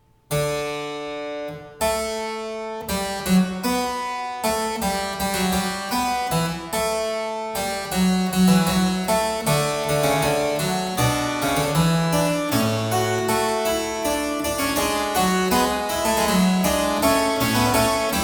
Prelude and Fugue in E Flat Major, Well Tempered Clavier; BWV 876; Johann Sebastian Bach